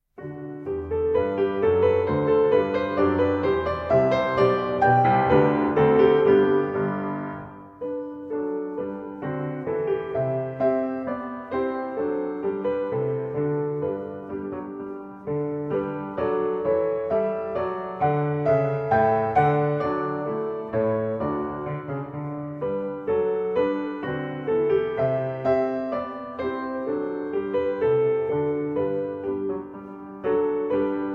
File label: Piano Accompaniment; 2-18 Bourree; Suzuki Violin